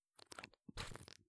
こぽっ, ASMR

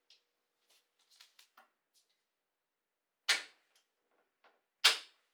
ドア閉める

